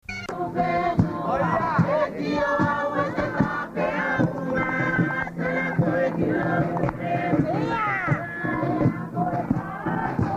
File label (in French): choeurs, wallis futuna; sigave